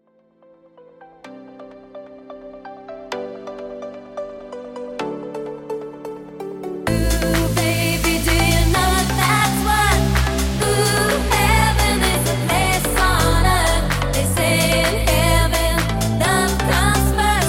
Epic 128 Bpm; djyan

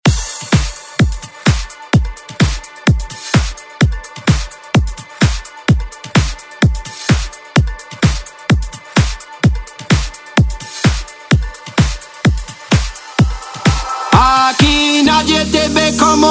dance redrum

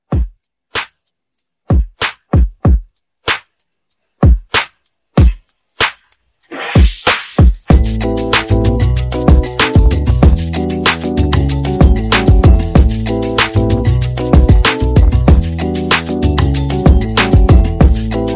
Mixshow Re Drum 95

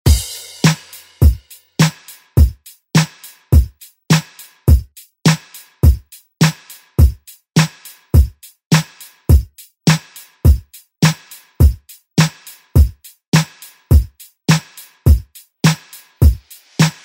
90s ReDrum; DJ Mhark

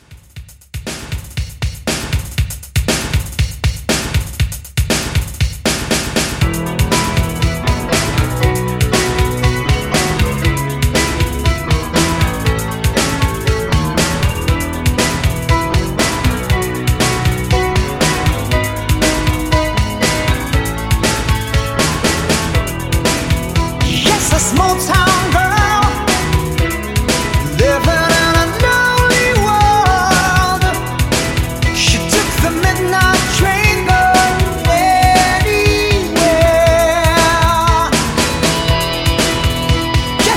Mixshow Re Drum 119

